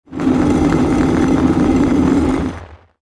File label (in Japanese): オブジェクトを押す